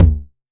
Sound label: TOMS 909; Wizoo - Platinum 24 Electronic; CHR TOMS 909